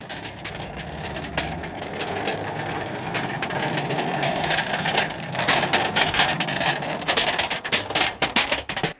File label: metal food cart rolling past; vehicles